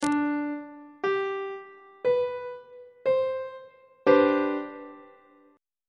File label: d double flat major 7th suspended 2nd 1st inversion on treble clef